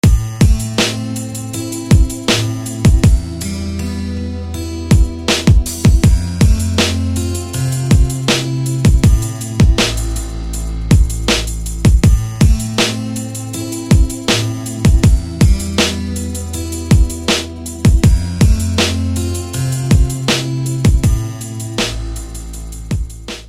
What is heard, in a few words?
Calm Harpsy